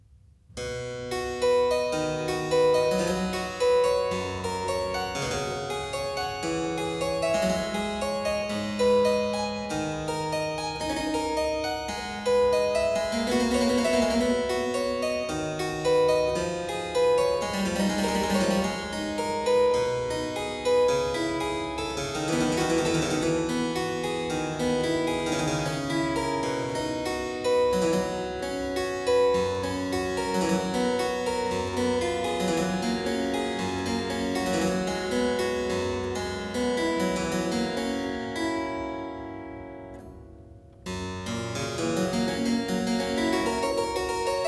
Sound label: Praeambulum in C major, Keyboard Works; J.S. Bach; BWV 924; Johann Sebastian Bach; Inventionen & Sinfonias